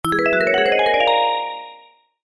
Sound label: multimedia alert musical warm finish complete success